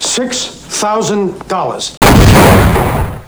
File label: exploding